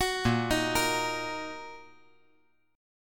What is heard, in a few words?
Bb Suspended 4th Sharp 5th Bb down, chord; arpeggiated